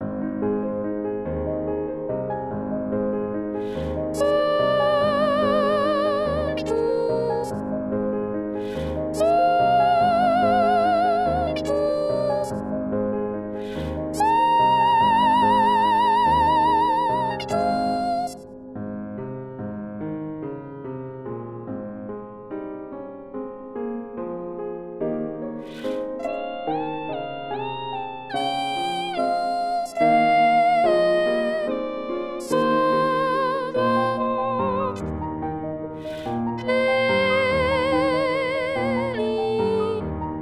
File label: requiem Sanctus soprano; saint-saens; camille saint-saens; requiem 6